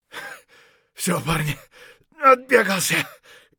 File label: hurt near death; Escape from Tarkov